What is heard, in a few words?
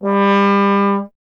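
bass trombone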